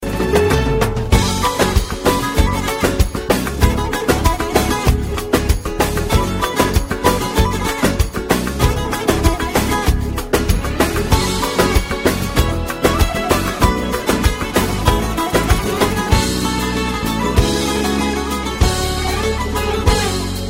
Cheerful Hit Pop

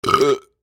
BURP

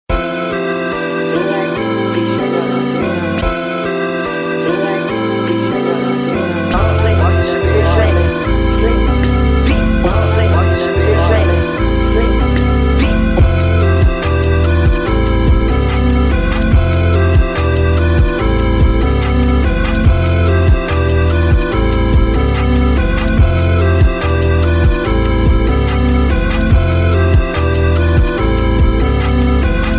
Time instrumental

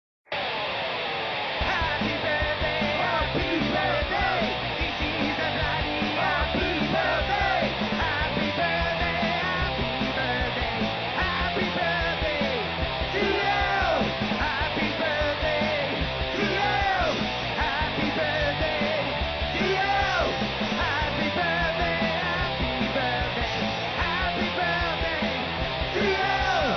Punk Happy Birthday

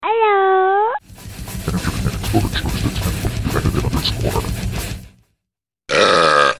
gatar

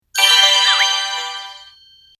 Chime; TsumTsum

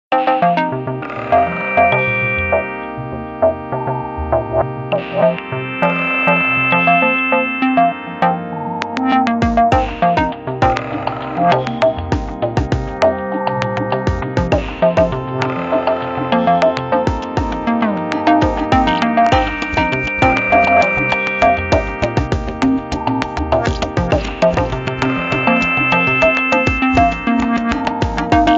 Cool 3d Beat

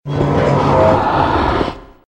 Growl; Pyramid Head

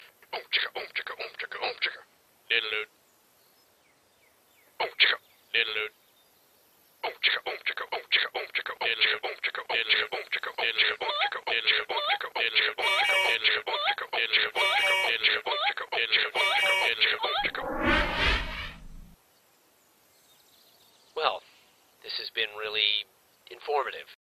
House Beat

